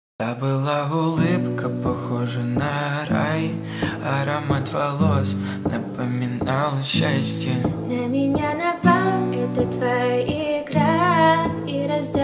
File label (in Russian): cover na gitara